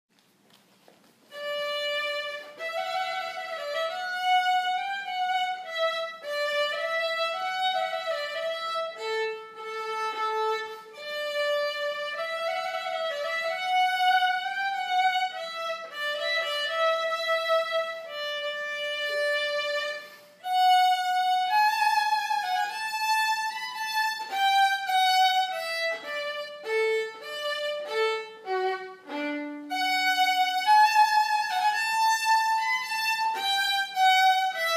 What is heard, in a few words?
Trumpet